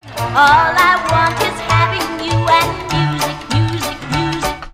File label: music